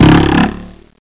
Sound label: spring; Take2Demo; StormsSound